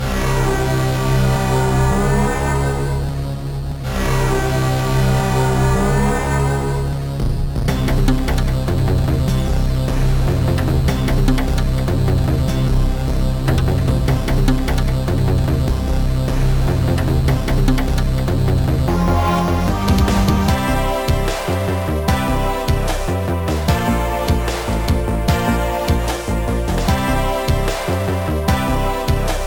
sweet ambience; Breeze

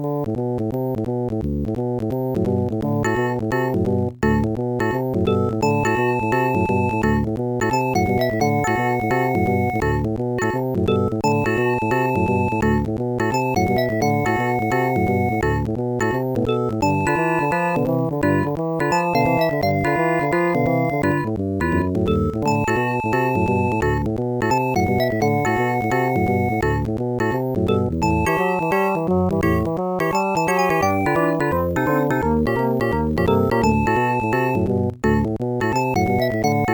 modem song; The Music Studio

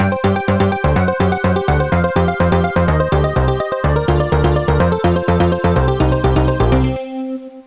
jingle death; Harlequin

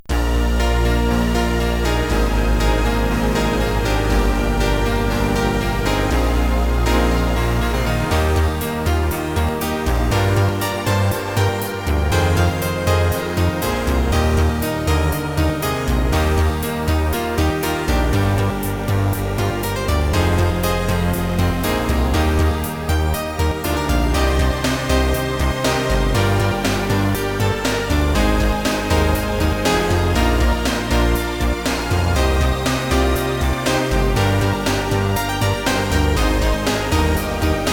rock piano, OctaMED SoundStudio

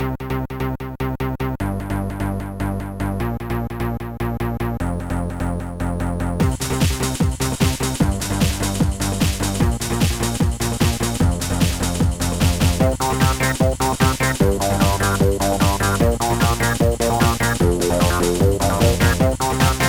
mod techno beat, Trackers; Modland; techno beat2

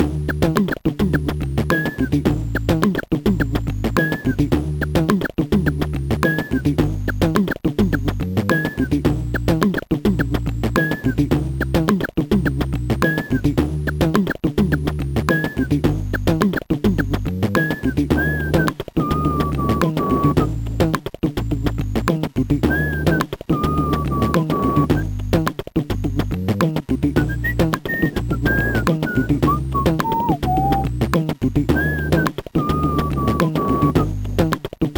human beatbox; Trackers; Modland; Mutant

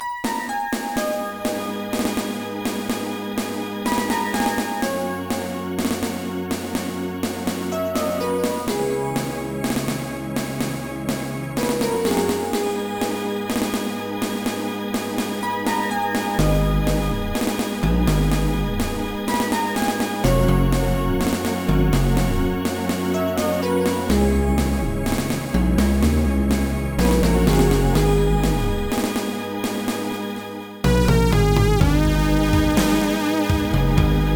lose life; TFX

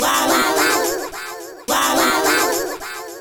beatbox; pinball dreams; Blaizer

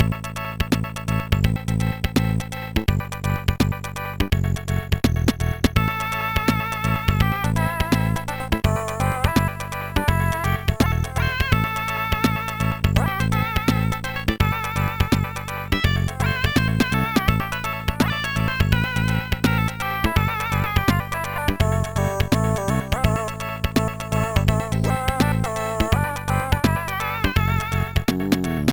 chiptune

